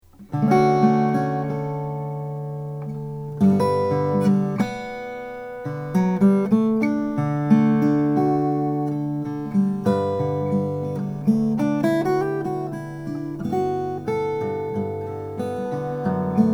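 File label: applegate fs 12 brazilian rosewood, guitars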